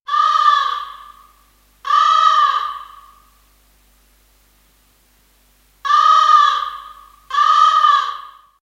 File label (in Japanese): ニワトリ; カラス